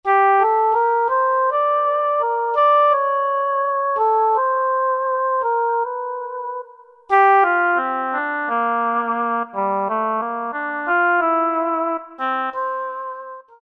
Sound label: Sweet Oboe, Korg 05RW